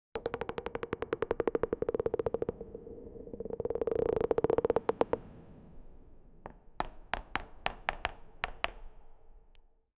close up foley recording, tennis

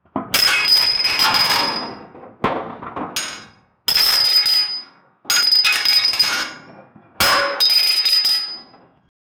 pounding of big heavy ste, blacksmith

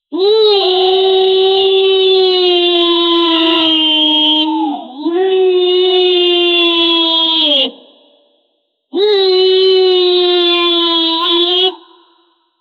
goblins yelling mmmmm

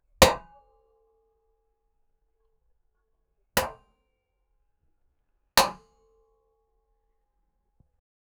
a metal ball hits a, wooden

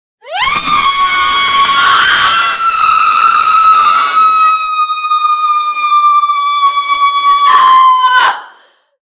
vocal

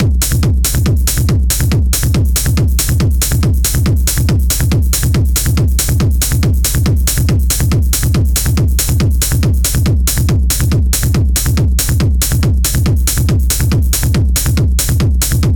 hardest techno very hard, 140bpm

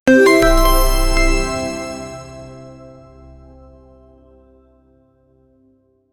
gaming unlock clipping sound

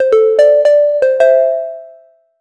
a quick gamey high pitche, streak

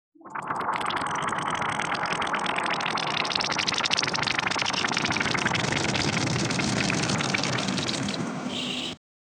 alien parasite moving, parasite

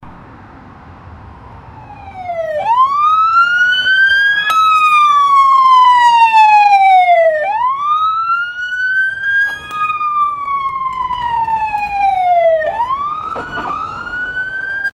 police car attention

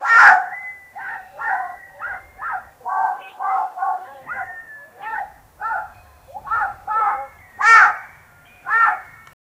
forest animal and aliens, aliens

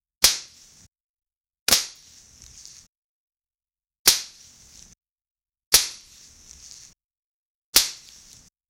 collect weapon; collectible

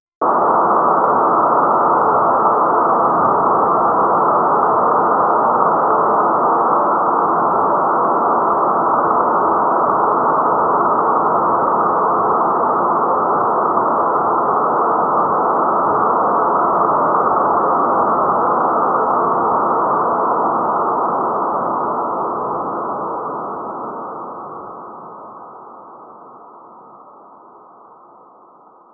soundscape of white noise, amniotic fluid

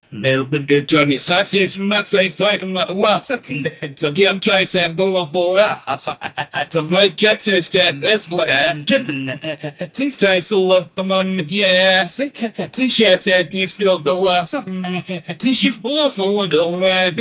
a british rapper singing

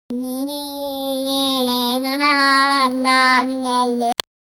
kid having nice and happy

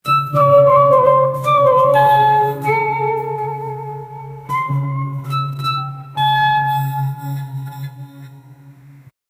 traditional dugdugi sound; instrument

